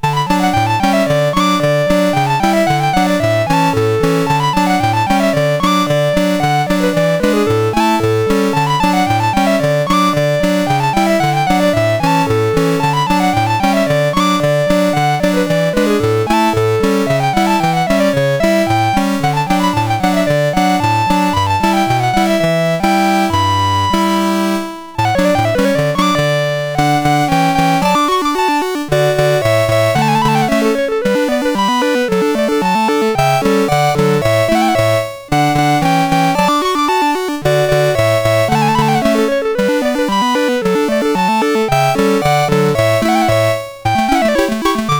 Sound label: Gavotte, MOS6581R2